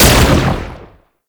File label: gunbrella shot 3D; Weapons; Warframe; Tenno; Koumei